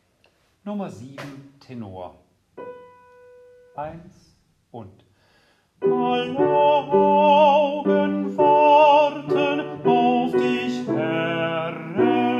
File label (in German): Tenor